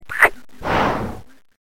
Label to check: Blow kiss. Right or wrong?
Right